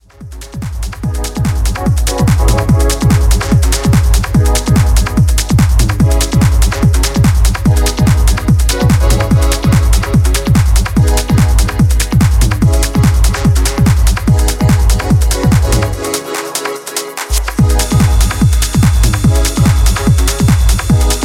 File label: signal drive, techno; electronic